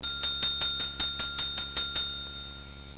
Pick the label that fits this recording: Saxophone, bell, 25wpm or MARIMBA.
bell